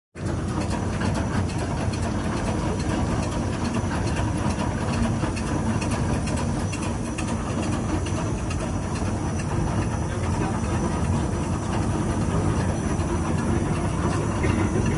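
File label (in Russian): шумный образец крутой железнодорожной вертушки в действии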